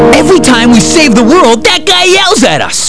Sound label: yells